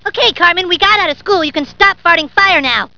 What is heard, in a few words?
stop fart